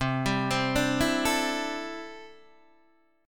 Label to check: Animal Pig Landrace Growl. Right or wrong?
Wrong